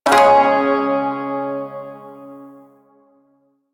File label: Shawzin Chromatic Chord